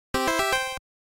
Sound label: game start